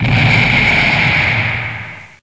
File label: Explosion; pokerogue; battle anims